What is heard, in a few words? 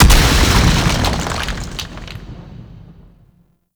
Summon Ground Burst; Vehicles; Abilities; Warframe; Nechro Mech